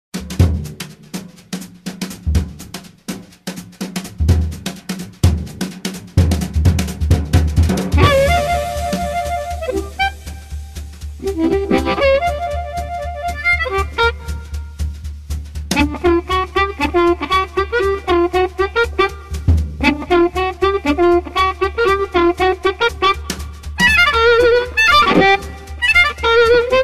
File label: Instrumental